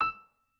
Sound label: piano